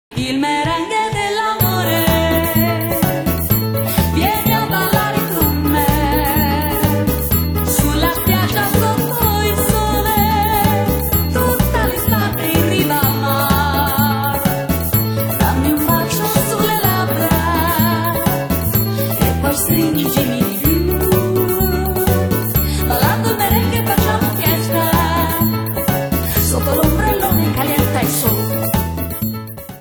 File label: merengue